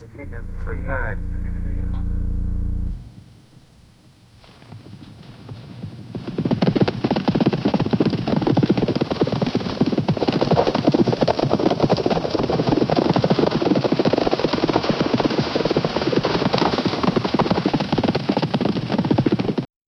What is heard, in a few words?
distant horse movement, snorts